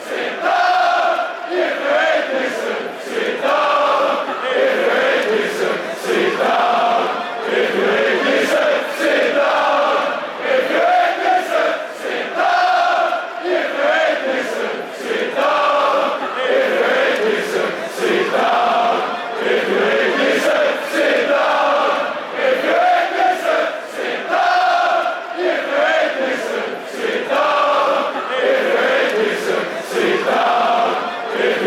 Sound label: sit down if you hate lierse, fanchants